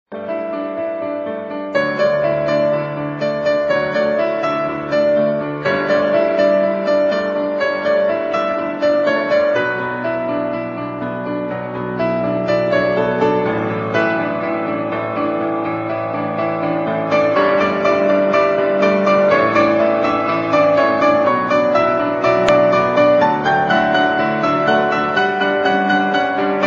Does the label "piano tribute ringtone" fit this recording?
Yes